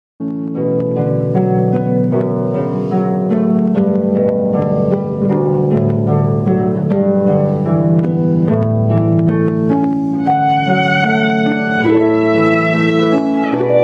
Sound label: Canone di Pachelbel